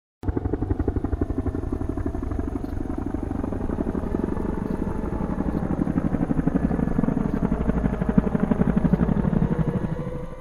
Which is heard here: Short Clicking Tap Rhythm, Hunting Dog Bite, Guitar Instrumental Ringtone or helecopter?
helecopter